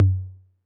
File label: Tom Low 808 Sat, Saturated